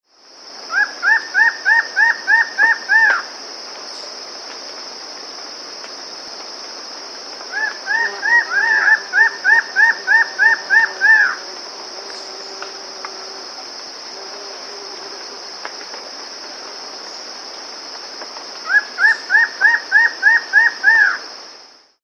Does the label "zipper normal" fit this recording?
No